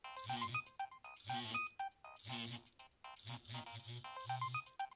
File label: nokia 6100 ringtones space age